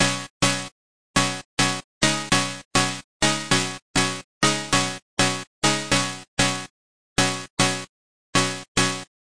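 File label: carbon synth percussion, 100 bpm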